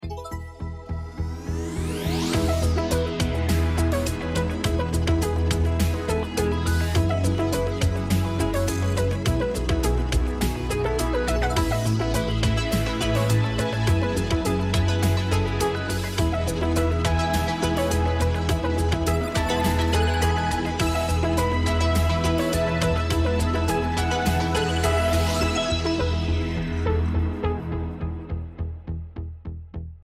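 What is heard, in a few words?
Normal Mode background music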